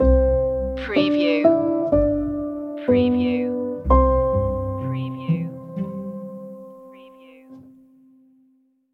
sleepy lullaby stinger

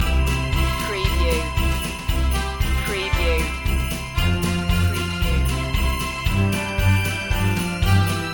jingle bells loop

